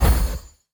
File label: Magic Transition